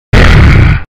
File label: hit; sonic exe